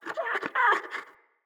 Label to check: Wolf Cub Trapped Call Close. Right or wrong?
Right